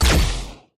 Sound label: Shoot Energy; Draw Your Game; android ios gamerip 2023